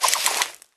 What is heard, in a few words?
achievement scissor fingers